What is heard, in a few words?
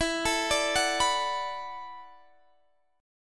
B Minor 7th E, chord; Bm7/E arpeggiated